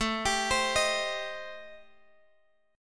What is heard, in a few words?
A Minor 7th Flat 5th, Guitar, Open G